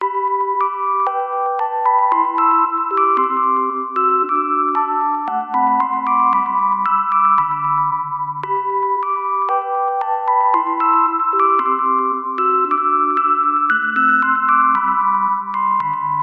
musical ice cream van musical chime; zapsplat

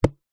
foley plastic tub empty tap base hand drum; zapsplat

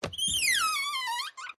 Wood Stress Creak Squeaks; DPA4060; PMSFX

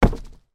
human jump land on wooden boardwalk; sound effects one

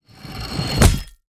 horror guillotine fall impact blade thud squelch; zapsplat

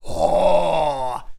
Human Evil; little robot sound factory

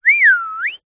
human whistle soft

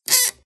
Stress Creak Squeak Groan; PMSFX

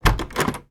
household door handle movement; sound effects one

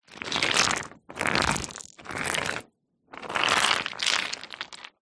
foley wet stones and pebbles movement; zapsplat

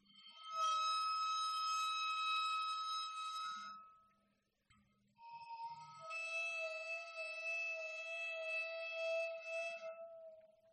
waterphone long bows dynamic; aston stealth

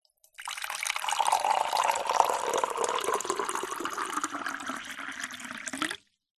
food drink water pour from kettle into mug

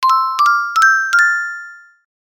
cartoon ascend mallet steps slow; zapsplat

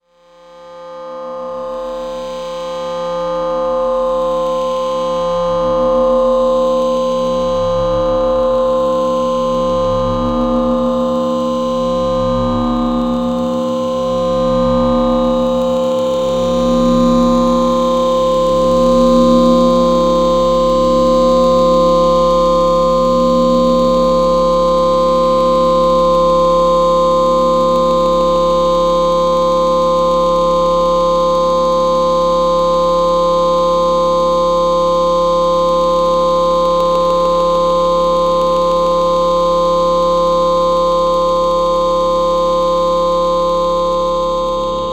science fiction forcefield drone; zapsplat

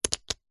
wood blocks small x3 stack fast; foley

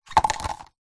household cups plastic drop onto others; zapsplat

